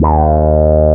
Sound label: QUAC, QUACK SOLO, Synth; InVision Interactive; Keith Emerson; The Most Dangerous Synth and Organ